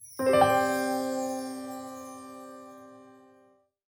Positive Stinger; Beautiful Girl Fight School

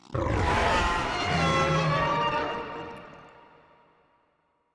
Roar Monster

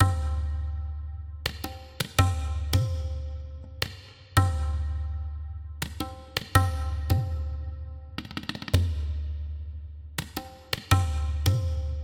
Sarco Stealth Rnd Tabla; Tintin Reporter: Cigars of the Pharaoh

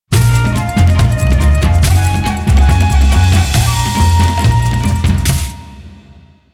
Win; King Hippo; Punch-Out; Wii

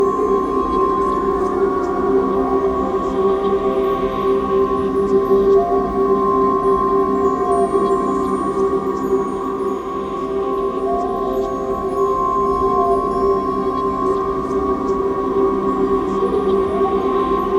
Spirit Idle Loop; quern undying thoughts linux macos windows xbox one gamerip 2016

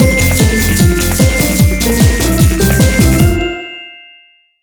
End Tag, music; Spanish; BDAY Shape Shifter

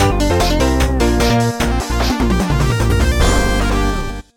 Minigame Lose; barnyard; game boy advance gba

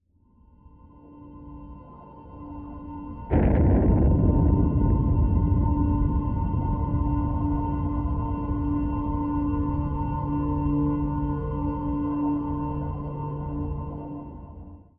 music cinematic drowning; Rage in Peace

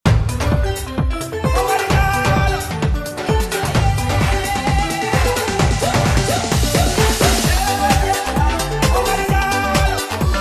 STAB

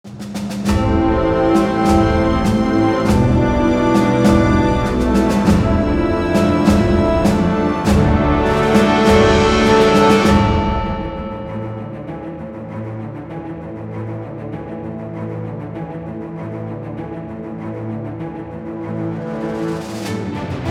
Victory; battle brothers beasts and exploration

